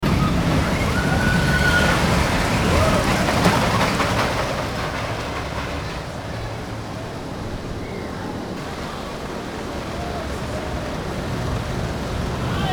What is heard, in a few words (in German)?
Bobbahngeraeusch